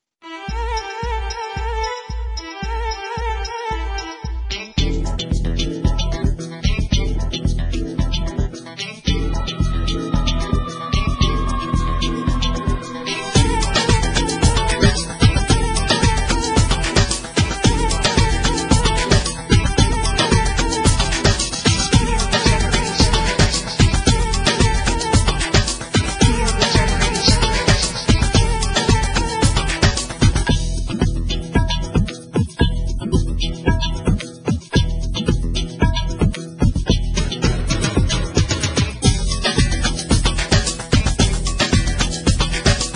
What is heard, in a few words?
Bonus Tune